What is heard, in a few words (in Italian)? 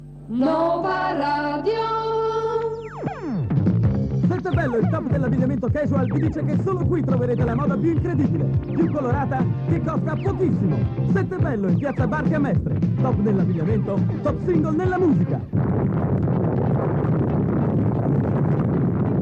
sigla top single; FM; novaradio